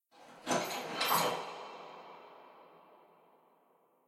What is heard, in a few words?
anim exit angry